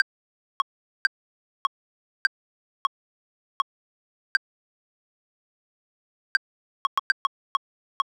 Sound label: many tempo changes